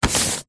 foley sheet of paper on cardboard box single rub with hand